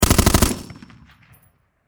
Warfare Machine Gun Springfield M-14 Short Burst Close Perspective